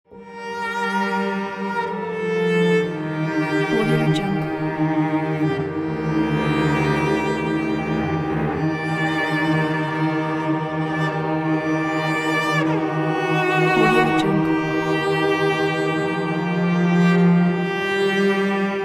halloween logo

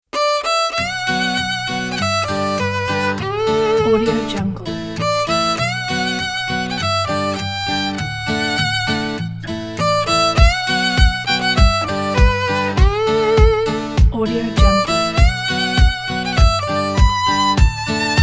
country violin; viospurkle

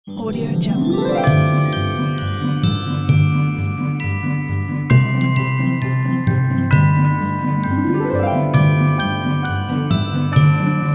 christmas logo

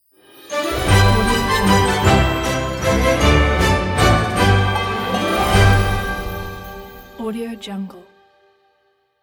we wish you a merry christmas logo

